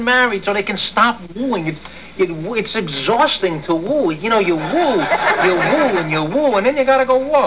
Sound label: woo